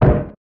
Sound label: wooden collision dry dull